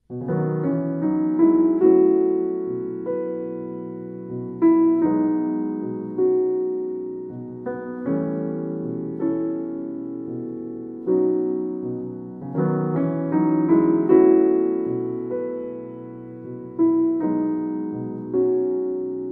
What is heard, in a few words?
Solo Piano